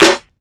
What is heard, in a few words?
snare organic